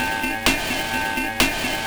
VFX2 Loop 128 BPM

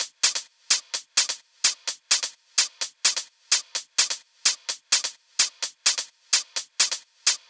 128BPM Drums; Vengeance Samples; Verve Kit

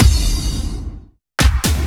Fill Ins 128BPM; Vengeance Samples

